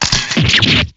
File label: damage